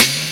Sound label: MOTO SNARE; E-MU Formula 4000 Series Vol. 1; Hip Hop Nation; Hip Hop Kit 2